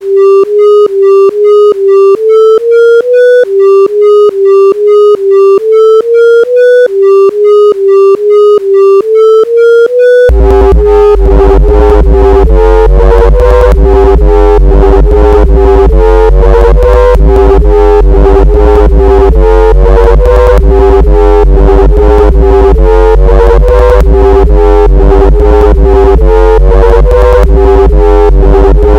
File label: Loud Instrumental Beat Ringtone